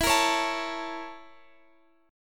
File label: Bb Minor Major 7th Flat 5th, E